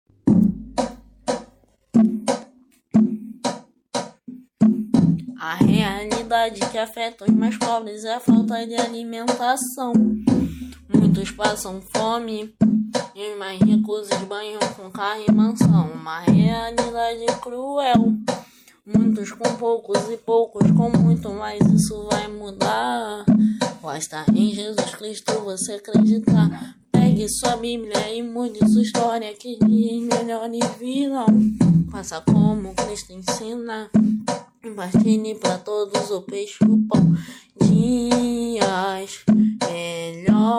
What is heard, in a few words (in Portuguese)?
rap da realidade, funk rap